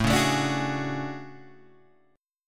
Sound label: A Major 13th, down Guitar Standard; strummed; capo=4